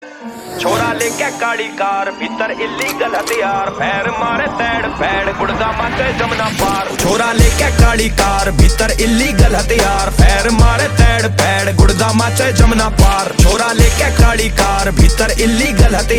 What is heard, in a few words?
RINGTONE, HARYANVI